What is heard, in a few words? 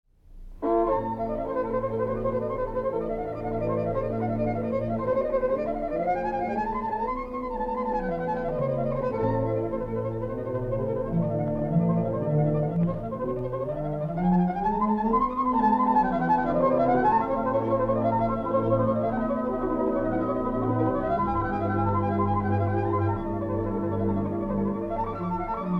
Sinding Suite in A minor, Op. 10 (1. Presto)